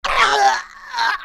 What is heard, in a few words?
Pirate Death Vocals; foley